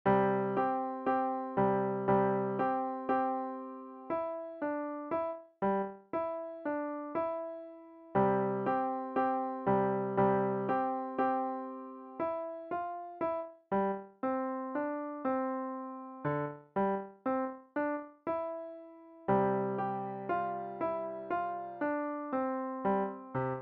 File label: Fanfare